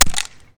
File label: wood break